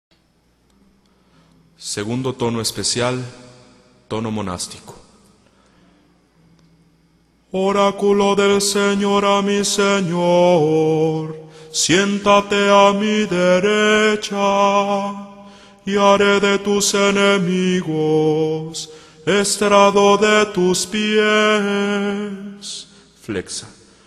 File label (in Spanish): tono monastico Entonacion de los salmos, a capella